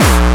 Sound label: bombo, kicks, Hardcore